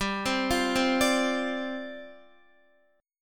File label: G 7th Suspended 4th G down Guitar